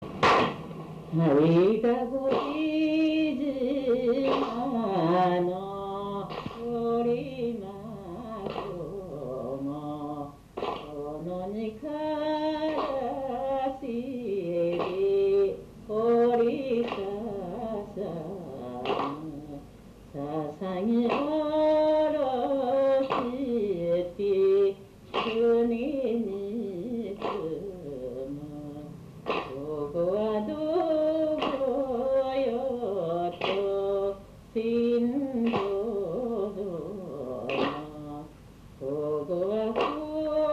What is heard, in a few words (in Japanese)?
sendo uta, minyou; 船頭歌; 東庄町